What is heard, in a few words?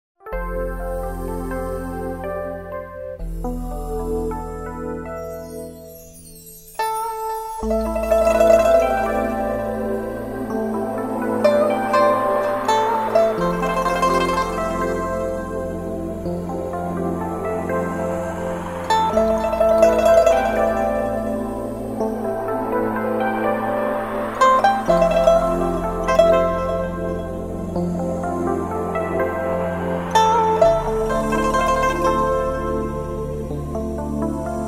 Falling Leaves, بی کلام; بی کلام لایت; پی پا pipa